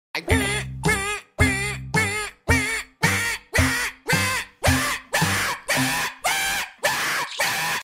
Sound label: alarm noise